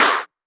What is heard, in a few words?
Group Clap, Roland SH-32 Drumset